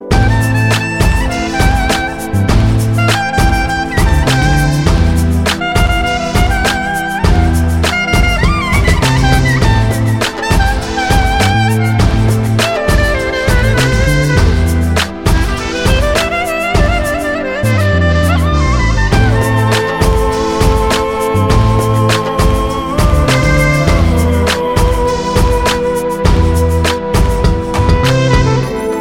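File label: armenian clarinet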